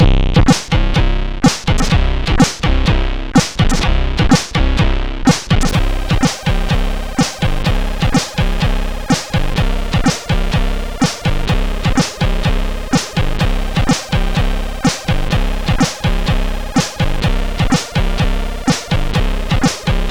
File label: sid, CSG8580R5; Sex Drugz and C64